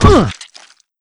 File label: body medium impact soft